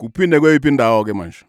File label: Vocal Chirp, African Voices